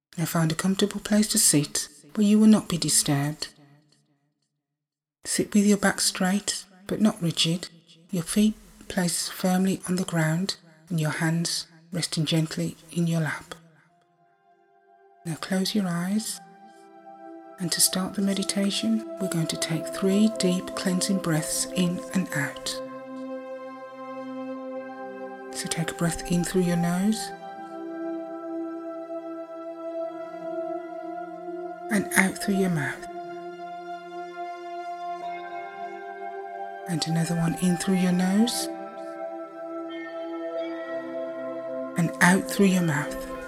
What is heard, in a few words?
Meditation